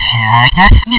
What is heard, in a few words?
DROWN